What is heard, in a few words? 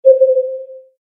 tonal